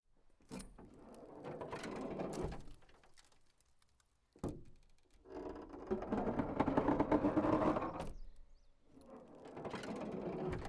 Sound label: stress creak